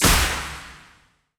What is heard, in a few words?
Tap Smash